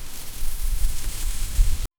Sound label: grass rustling, outdoors